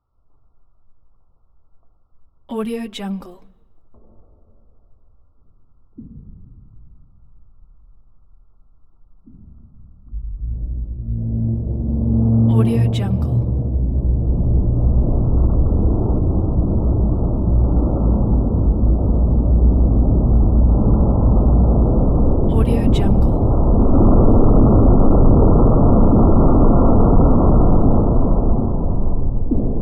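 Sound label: metal screech dumpster lid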